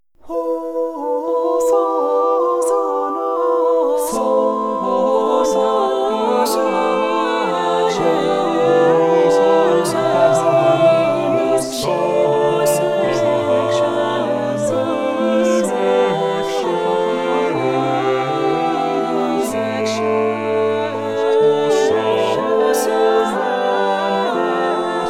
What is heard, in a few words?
Equal Voices